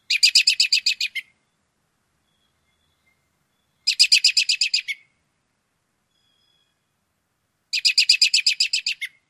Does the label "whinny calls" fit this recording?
Yes